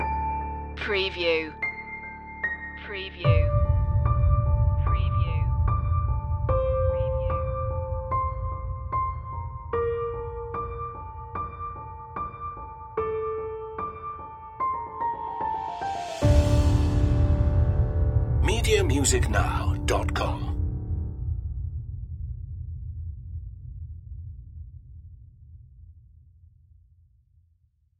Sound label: gothic trailer hero